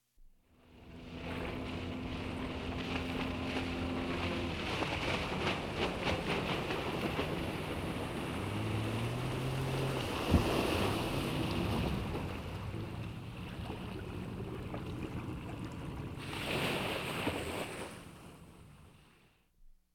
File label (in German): Suedsee Naeherkommendes Motorboot